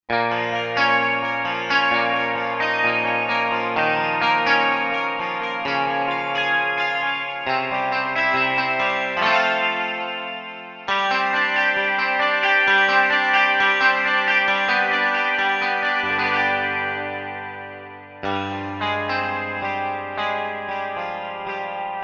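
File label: Ricken 12 string GT; Roland SRX-07 Ultimate Keys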